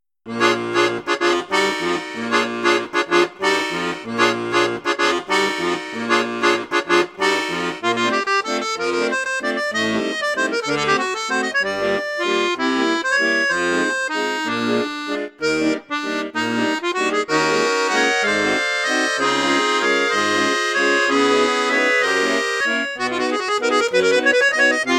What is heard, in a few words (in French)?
registre flute juste, accordéon; fratelli crosio